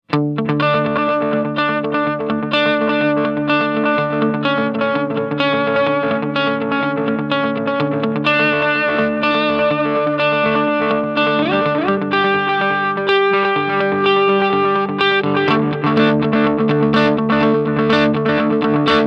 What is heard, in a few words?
Tele Neck Drive Delay